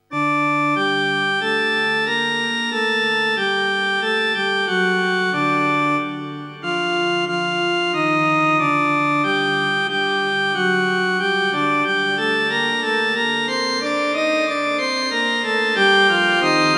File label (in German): wer nur den lieben gott lasst walten fuge orgel